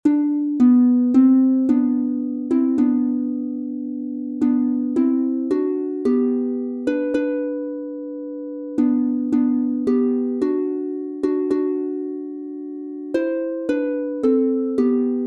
G-Dur instr, Harfe; Jagd und Jaegerlieder; Steig ich den Berg hinauf

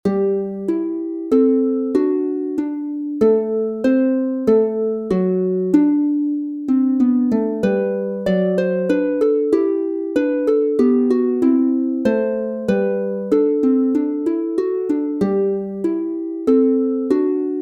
Menuet G Dur instr, harfe; Kleine Stuecke grosser Meister; Boehm; Joh. Seb. Bach

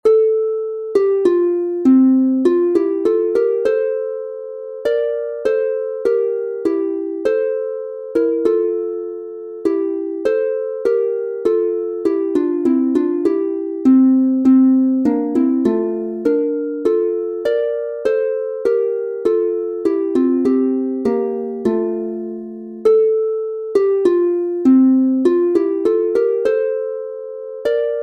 Morgenlieder F Dur 2 st instr, Harfe; Fliegt der erste Morgenstrahl

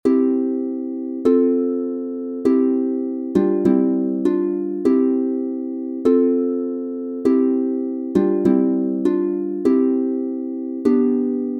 Advents und Weihnachtslieder O du froehliche C Dur 3 st instr, Harfe

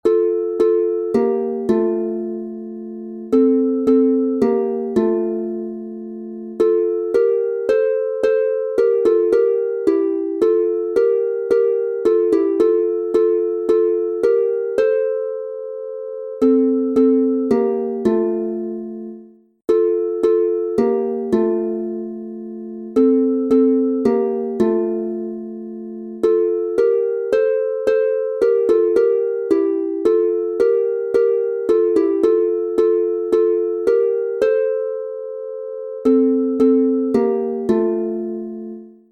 F-Dur 2 st instr, Harfe; Winter ade

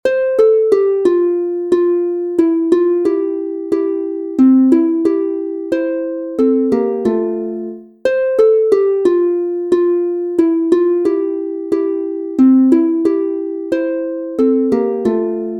F-Dur 2 st instr, Harfe; Kinderlieder 2 stimmig; Bei meiner Tante Josefine

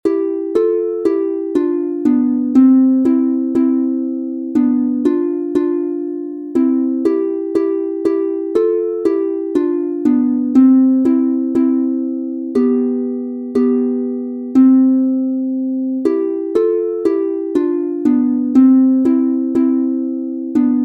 C-Dur 2 st instr, Harfe; Kinderlieder 2 stimmig; Dornroeschen war ein schoenes Kind

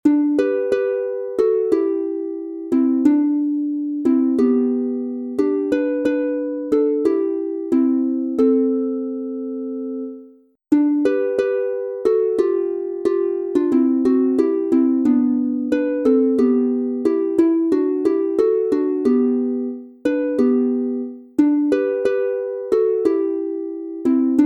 G-Dur instr, Harfe; The world must be coming to an end

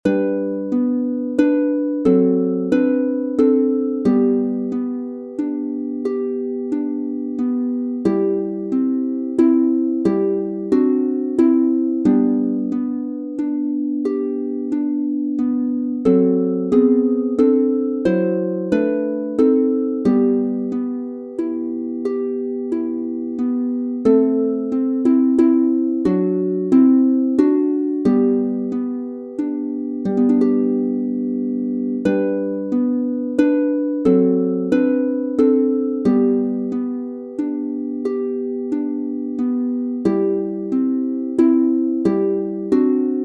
Leise rieselt der Schnee G Dur mit Bass instr, harfe

